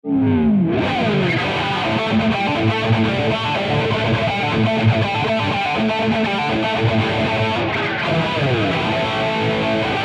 X-VIBE ELECTRIC; xgear

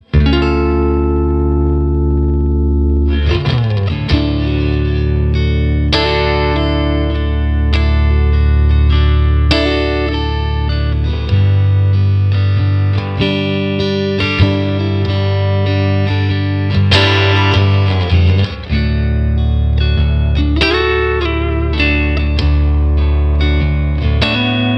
Fender Custom Twin Ballad; 真空管アンプ

